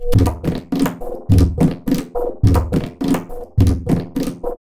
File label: Tabla, 105 BPM; Zero-G; Pure Tabla